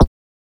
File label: perc